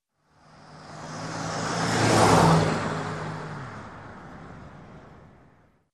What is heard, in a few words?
SUV passing by fast